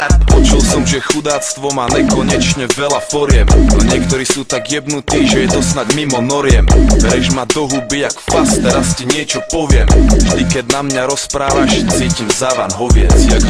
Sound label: Slovak Rap